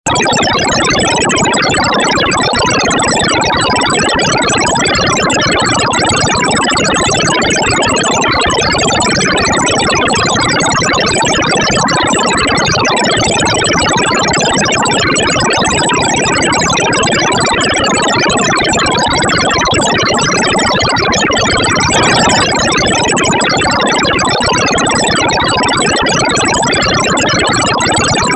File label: loud space sound ringtone